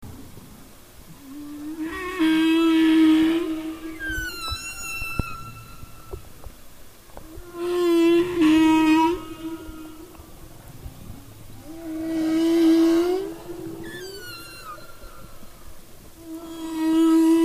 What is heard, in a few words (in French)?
Baleine Bosse; fiches cétacés